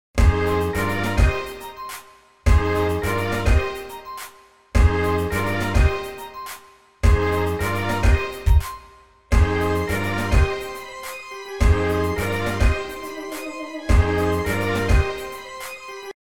moto digital signal, motorola razr v3; ringtony motorola razr v3

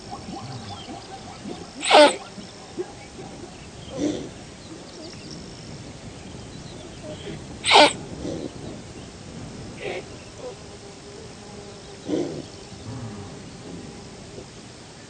gazelle; gazelle toms

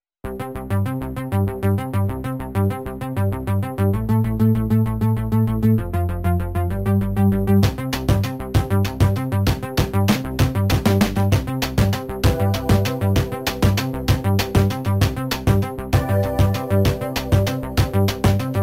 8 bit music

